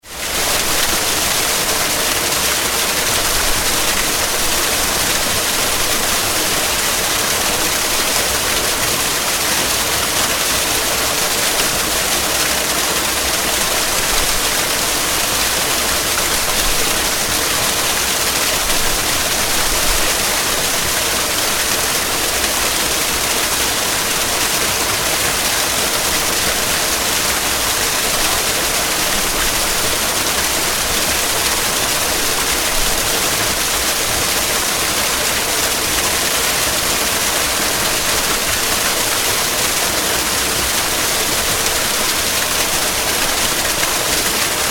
Waterfall Fast Splattery Long, Splattery waterfall; Splattery waterfall, good definition. Can also be a water surge, water flow; Water Sound Effects